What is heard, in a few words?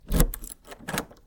turn key, 鍵を差して回す; turn key3